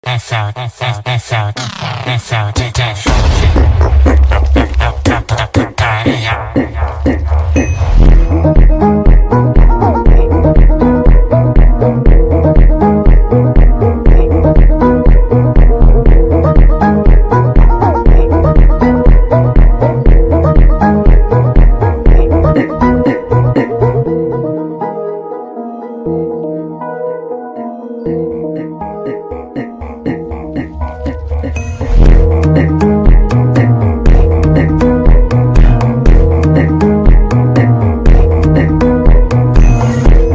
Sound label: Brazil Funk; Minecraft